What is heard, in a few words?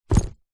shoes business leather buckle zipper womans set down on wood floor; zapsplat; foley